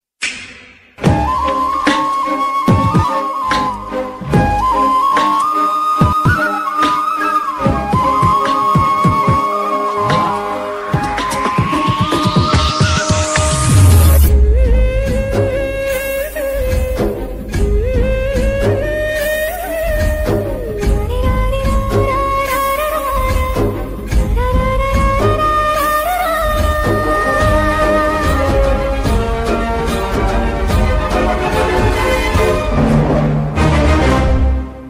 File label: instruments ringtone instrumental music